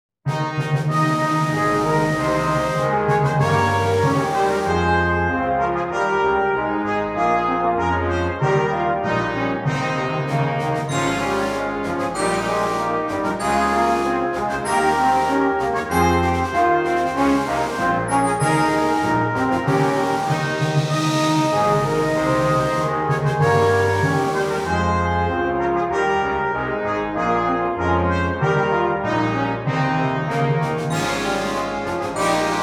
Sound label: Funky Brass Perc